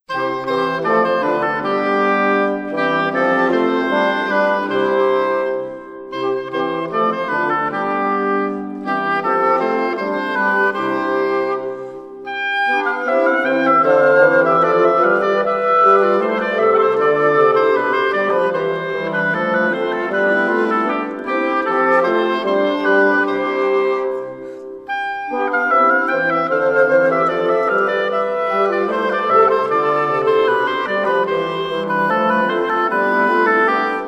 Instrumentalis - Ding! dong! merrily on high; Fillers

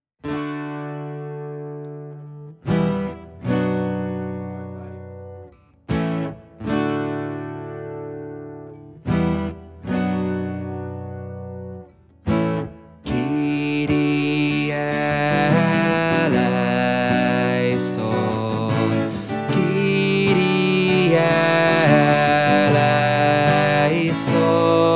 Latin mise Kyrie; C-dur

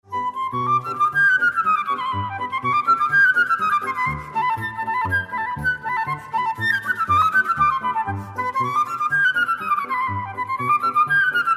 Jingle; SZO SZOT KOVET; SEPSI

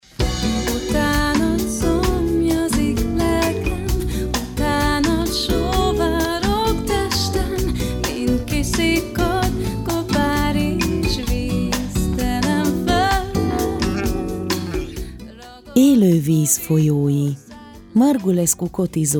Jingle; Elo viz folyoi

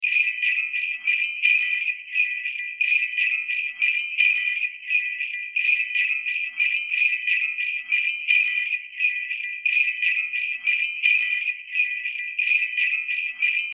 Jingle bells

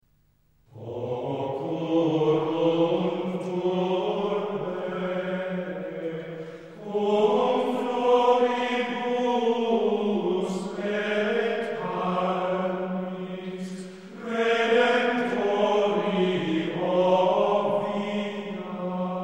Choralschola Occurrunt turbae, Gregorian chant

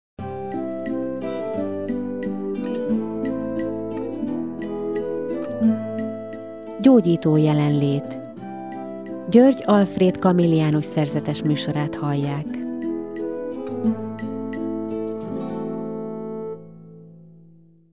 jingle; Gyógyító jelenlét BEV

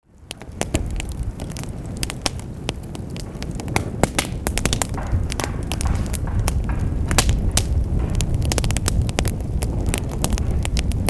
firewood; ESZKOZ hangok

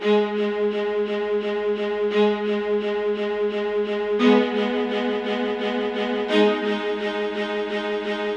ab minor building suspense violin loop 86 bpm; LOOPS

